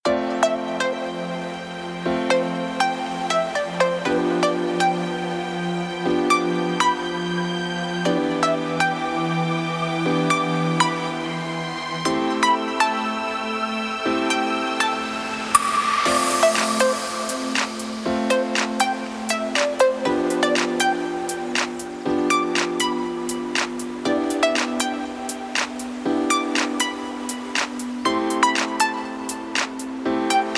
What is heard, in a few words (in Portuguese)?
House sem vocal